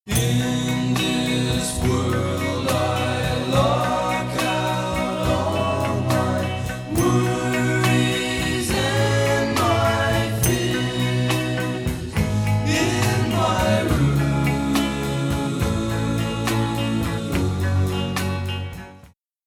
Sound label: harmony voices acc